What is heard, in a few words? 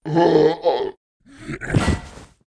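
shielder death, monster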